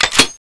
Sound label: gatling clip in, weapons